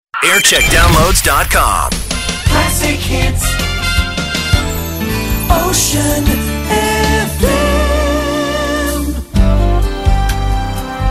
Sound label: jingles; ocean fm